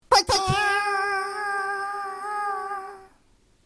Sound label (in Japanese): 水が一滴